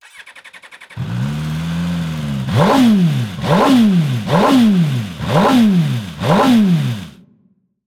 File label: brutale 1000 rr